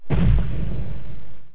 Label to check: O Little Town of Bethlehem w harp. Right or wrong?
Wrong